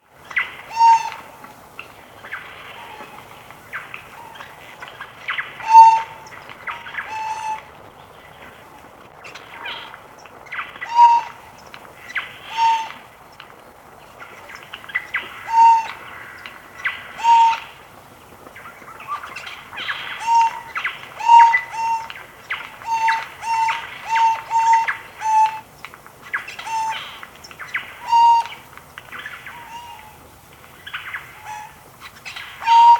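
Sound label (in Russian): sojjka, jay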